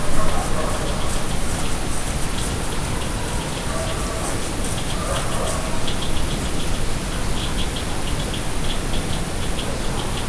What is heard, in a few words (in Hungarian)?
rágcsál a fa alatt, nagy pele; allathangok; termeszetben; perkupa